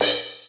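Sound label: Cymbal; Ableton Foundation FreePacks; Foundation Drums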